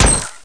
ice Cannon Impact; NETSTORM

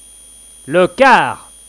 Chime; Chimes Bells

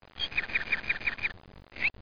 AMB; combat

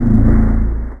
Bass; aloneinthedark4

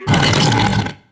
amazon statue hit; DAoC

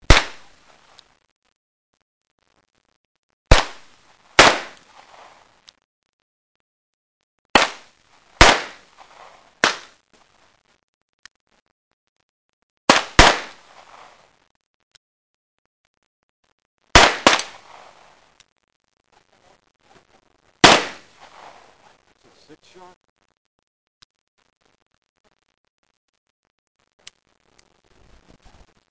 GUN FIR

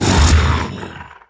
sobekite hit; DAoC; Toa; Gamestar

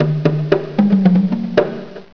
Drums; 11khz Drums; Macworld (1998-05); Games World; Triazzle 1.0 Demo

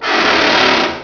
DOOR; Hidden & Dangerous; h&d demo

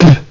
SPIT; Human; Amiga 8-bit Sampled Voice; Amiga Computing 68

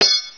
ric, weapons; Hexen2

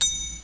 triangle

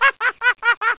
LAFF

